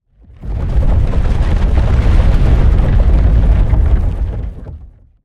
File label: beasts herd run